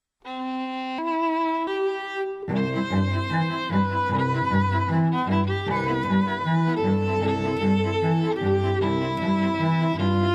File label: String Trio